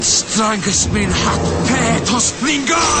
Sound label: Spell